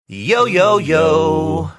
singing yo yo yo

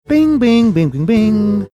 singing bing bing bing bing bing

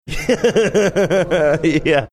laughing saying yeah

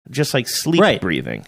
just like sleep breathing

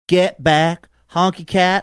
singing

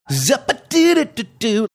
scatting

